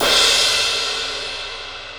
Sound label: 18 inch crash crash, cymbal; a custom efx; Zildjian